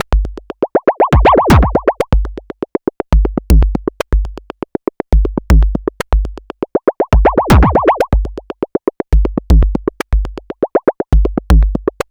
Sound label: Anabeat Mix, Beats